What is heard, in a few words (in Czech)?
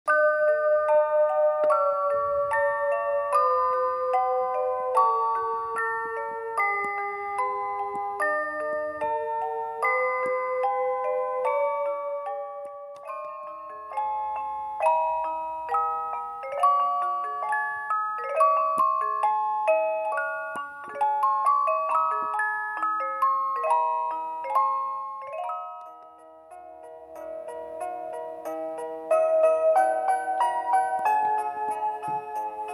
ukazka zvuku alarmu